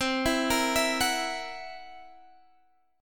C 7th Flat 5th C down, chord; C7b5 arpeggiated

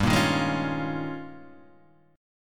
D sharp 11th G

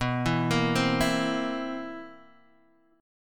C 7th B, C7/B; arpeggiated; JGuitar

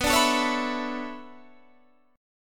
B Minor 11th B down Guitar Standard; Bm11 strummed; JGuitar

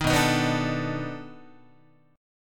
B 7th Sharp 9th, down, Guitar; strummed

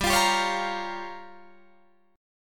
G Minor 7th Flat 5th, Guitar Drop D

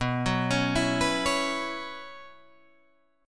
B Suspended 2nd Suspended 4th, Guitar, Drop D

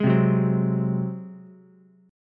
Gb Suspended 2nd Flat 5th Ukulele, chord; Gbsus2b5 strummed; 1,0,2,3

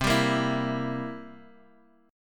C 7th C, Guitar Open D, chord

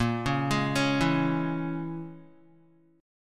Bb Minor 7th Flat 5th, Guitar; Bbm7b5 arpeggiated

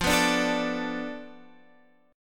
F 6th Flat 5th F down Guitar Drop D, chord

